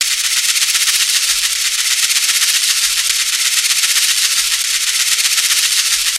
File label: cabasa